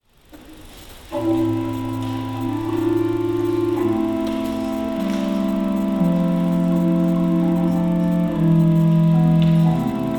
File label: Recit, organ; Lambert Chaumont